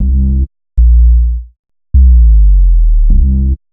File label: Bass, Futurist Drum & Bass